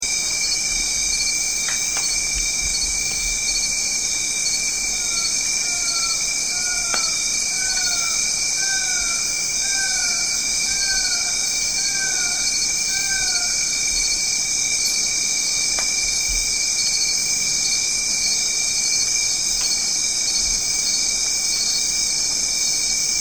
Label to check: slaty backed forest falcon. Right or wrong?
Right